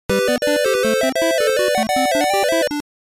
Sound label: Game Start; Oretachi Gesen Zoku; BurgerTime Music CD